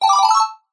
multimedia game sound synth mallet style short generic action tone